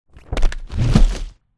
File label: flyer land close; boneyard; Titanfall 2